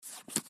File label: card deal; new-years